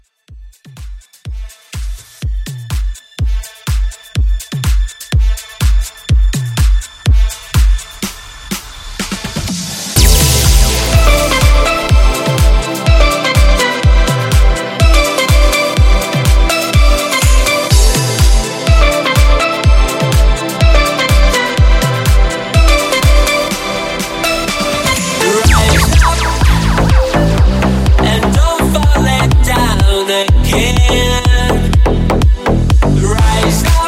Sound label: Rise Up IN OUTRO House 124Bpm